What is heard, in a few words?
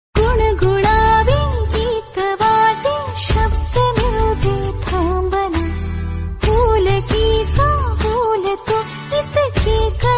Female Version Ringtone